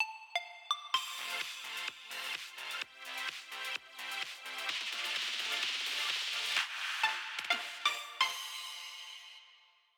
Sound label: Radio Jingle (Day)